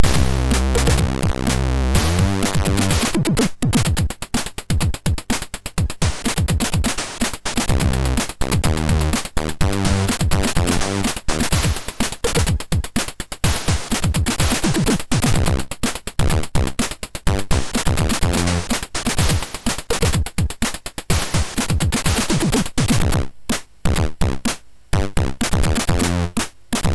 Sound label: Music, MOS6581R3; sid